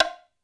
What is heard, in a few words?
Hollow, Drum Machines Alesis D4